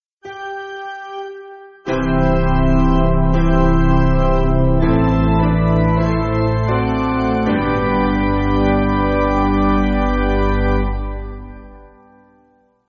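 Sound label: Amen Piano, Music Backing Files for Churches; 1/C Twofold - Dresden; Basic Piano & Organ (CM)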